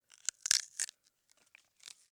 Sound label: celery breaks